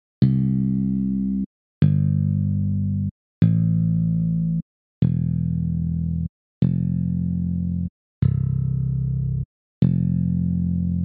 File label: bass only